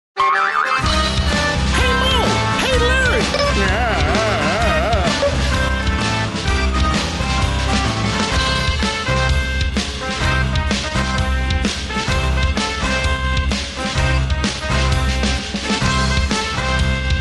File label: with sound effects